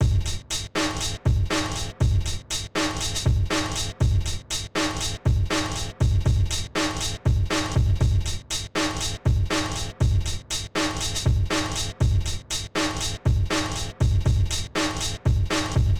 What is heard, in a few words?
Half Blood - 120bpm; Cut-up Breaks; Kizes Cut-up Breaks